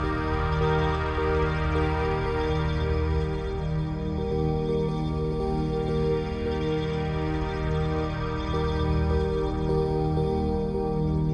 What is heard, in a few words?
intro ambient; Summer Catchers